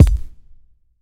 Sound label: ambient kick single shot g key, Royality free bass drum single hit tuned to the G note.; kick drum samples